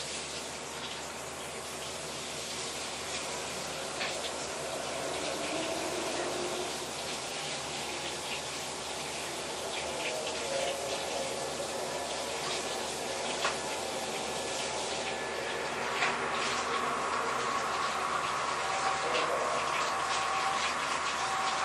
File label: bath siren; middle